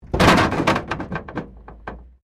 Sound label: Wreck Door Panel Clunk Metal; Wrecked Cars, impacts, crashes, scrapes; Objects